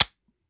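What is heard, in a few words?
weapon fire first player; gmod; viper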